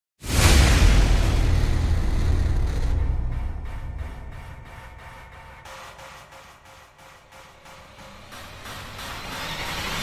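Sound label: trailer backends percussion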